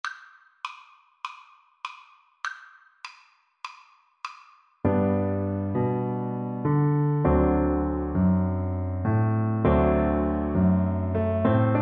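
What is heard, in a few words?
VLC karaoke, Cello; Hush Little Baby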